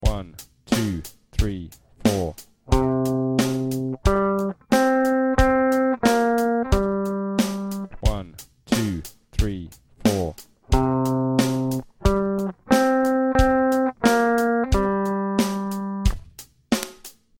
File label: Eartest Melodic, guitar; Grade2 Eartest Melodic